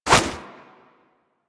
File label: Inf Punch Whoosh B; Warframe; Weapons; Infested; Inf Kick Punch